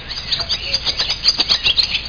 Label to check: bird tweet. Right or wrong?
Right